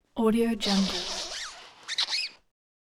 zip up dress

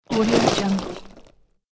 golem sits down

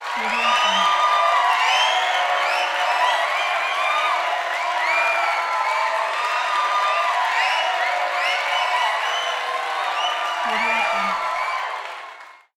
applause

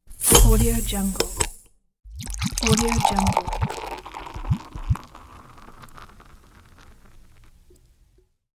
beer bottle open

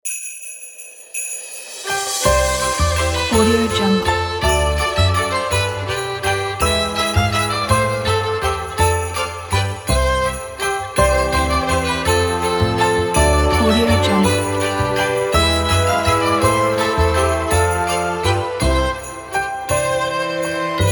we wish you a merry christmas violin

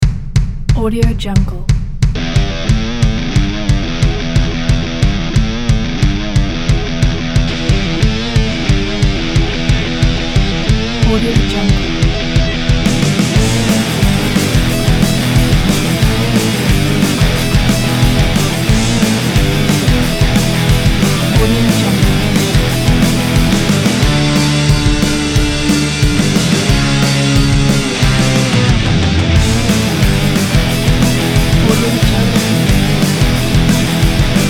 heavy rock

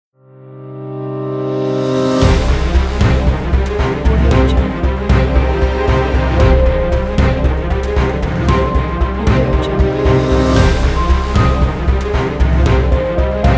breaking news intro; aplechovmusic

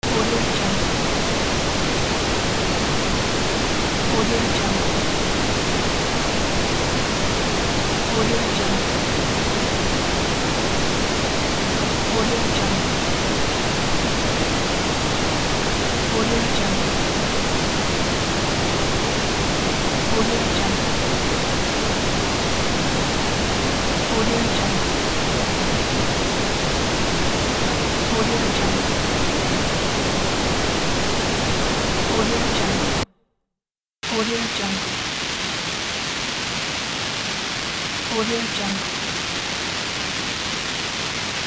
white noise loop

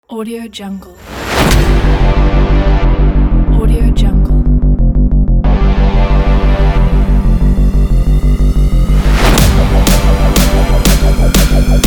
extreme sport trailer; alexiaction

